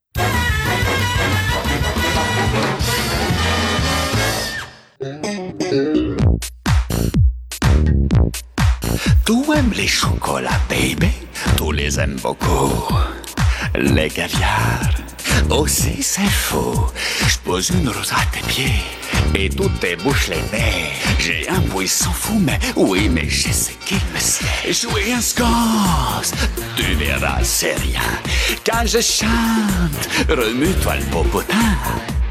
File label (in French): Chanson Funk; Pépé le Putois; Sconce